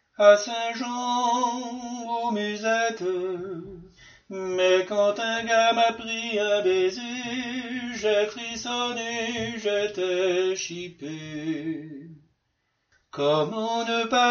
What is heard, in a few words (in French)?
soprano